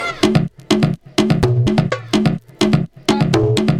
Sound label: tribal perc 126; fakir